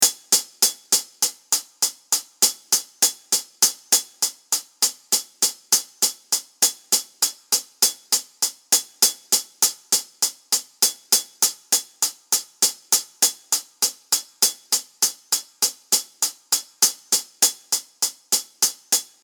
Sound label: Hi Hat Only